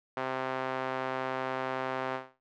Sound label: SAW BP CutOff 1022Hz; Alchemy